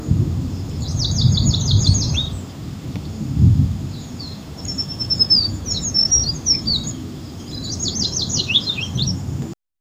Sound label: bird singing